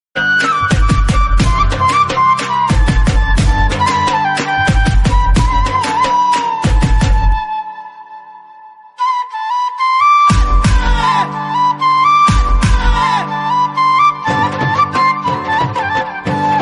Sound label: navratri special chogada flute ringtone